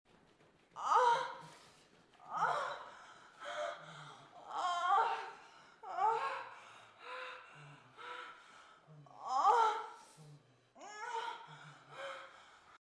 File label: sex, moans; The Witcher Hunt